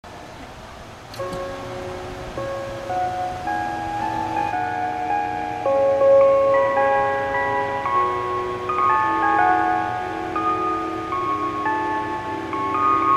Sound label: chime